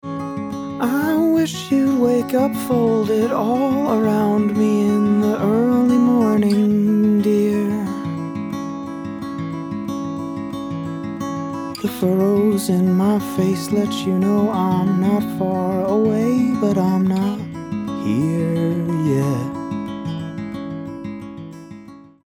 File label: Vocals and Guitar No EMT; UAD Spark